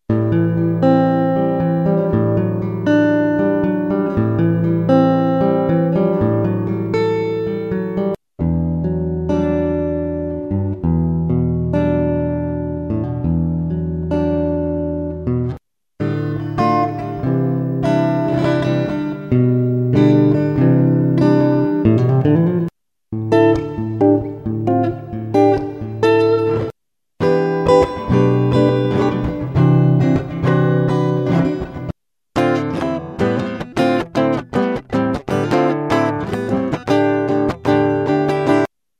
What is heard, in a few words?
ambient